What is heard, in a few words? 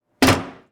Slamming Door